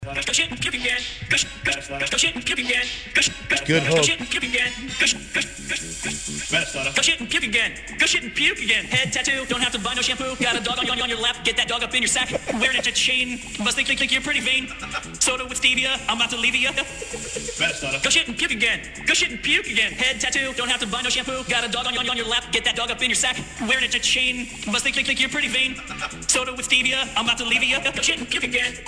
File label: rap